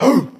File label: "woof"; ooh ahh; human